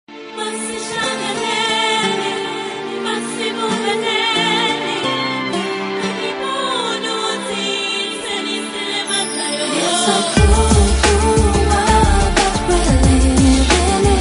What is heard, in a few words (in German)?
romantischer deutscher klingelton